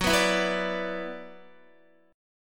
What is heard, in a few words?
Gb 7th Suspended 4th Sharp 5th Gb, Guitar; strummed; x,9,9,9,11,9; JGuitar